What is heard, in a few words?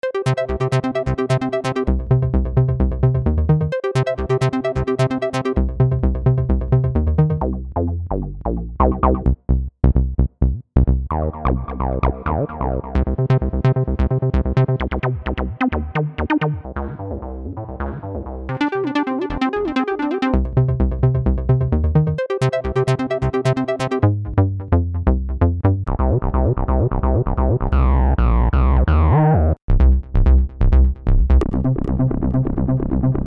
Menu, Music; GeoJam2021